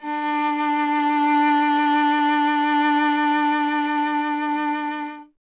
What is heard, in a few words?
viola D; Miroslav Vitous; Solo Instruments VOL-1